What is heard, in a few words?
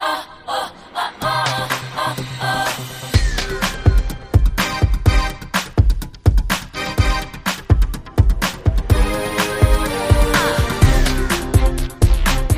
Tough Breakz